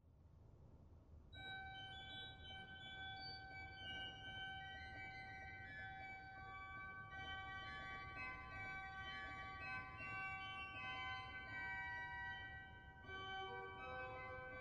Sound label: Flautino 2, organ; dortmund; Sauer